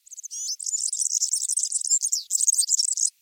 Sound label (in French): Serin cini chant